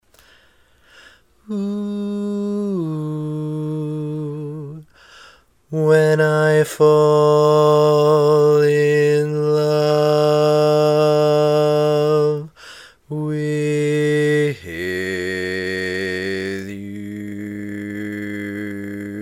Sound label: simple, Bass, barbershop tags